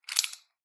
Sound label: gun shell check; soundscrate